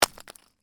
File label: Ice: small chunk of ice lands on hard surface; Snow; Stuart Duffield